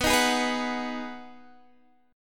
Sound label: B 6th B down Guitar Standard; strummed